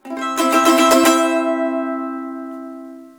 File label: mando ring